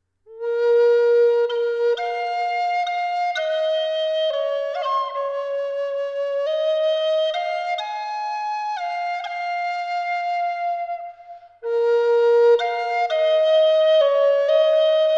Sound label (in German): Ahorn Zwetschge B mittel 432 Hz; Wiesel